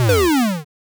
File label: power down